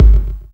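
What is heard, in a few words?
bd, 808 bass drum; Best Service Gigapack 2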